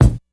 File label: prominent bass drum single shot a sharp key; Duration 0:01; Channels Mono